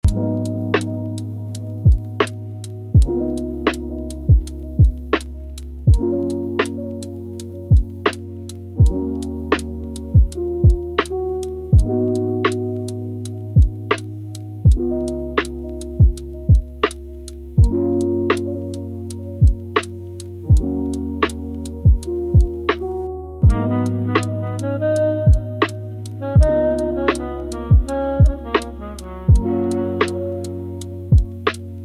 waves of quiet chill lofi

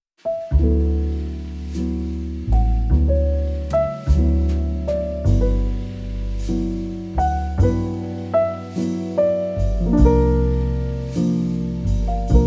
cozy romantic relaxing podcast jazz music

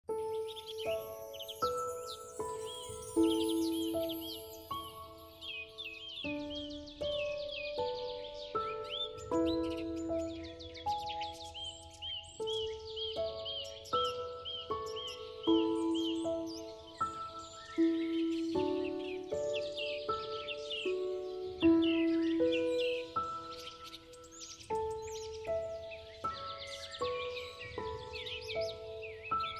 relaxing birds and piano music

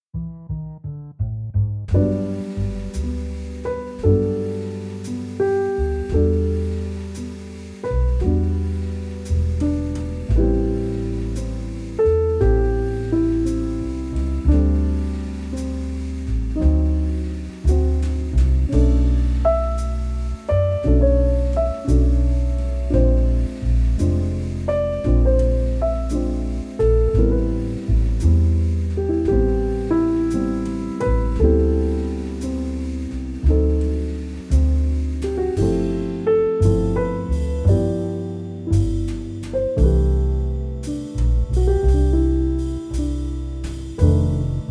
melodic jazz podcast slow cozy relaxing

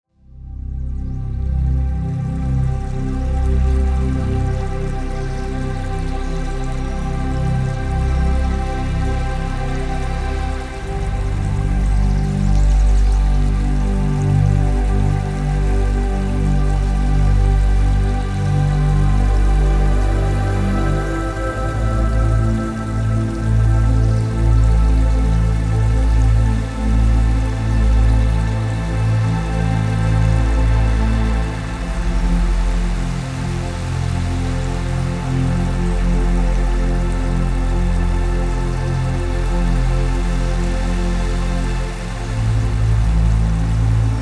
Meditation at the River

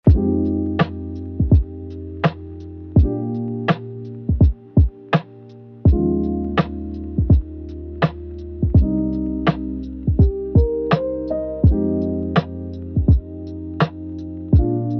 dreamy whispers chill lofi

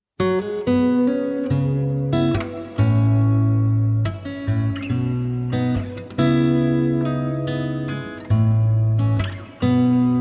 calm at sea, folk

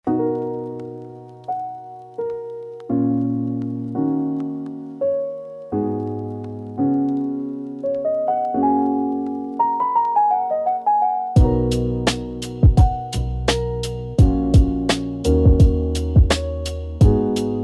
dream float relaxing lofi

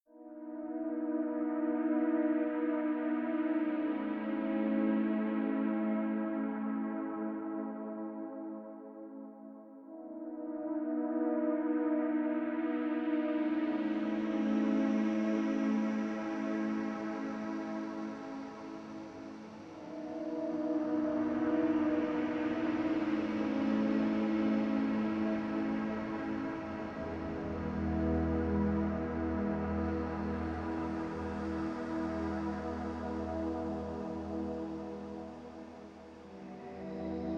art flower ambient zone, ambient soundscapes

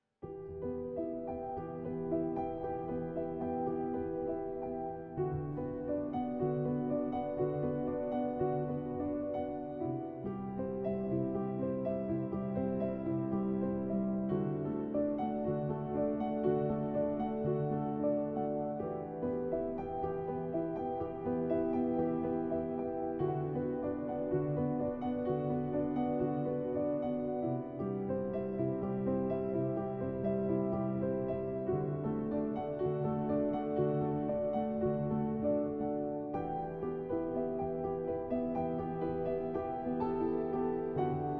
cinematic soft piano

